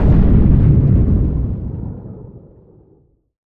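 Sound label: incendiary grenade detonate distant; arccw go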